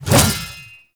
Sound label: Shield Whoosh; Warframe; Duviri; Dax; Mace And Shield